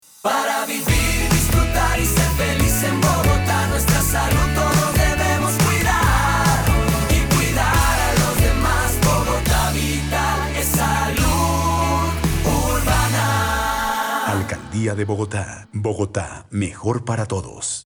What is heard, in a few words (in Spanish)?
Coro jingle